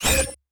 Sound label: Player Special Loaded